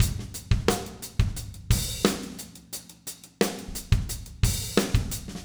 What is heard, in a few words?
088 Rock Drums Verse; Pop Rock; Moderate Tempo; Pro Tools Essential Loops; Digidesign